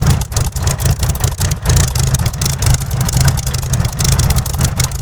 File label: Rolls Royce Merlin engine, aircraft; DCS